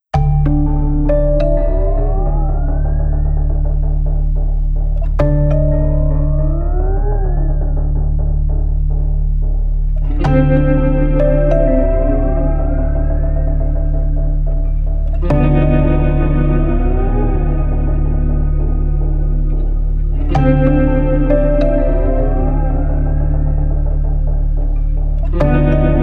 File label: Atmospheric Tension; ivox